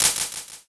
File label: clap